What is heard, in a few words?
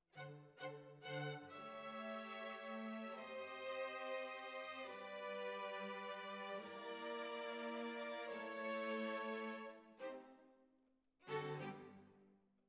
Violins Over